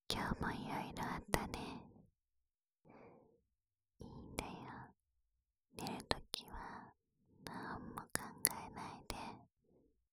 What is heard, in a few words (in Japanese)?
『添い寝（反転）』, ASMR